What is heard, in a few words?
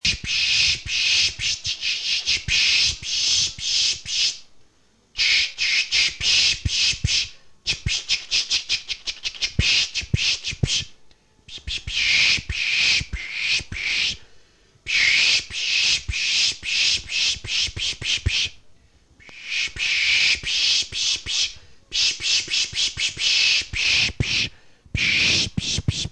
pishing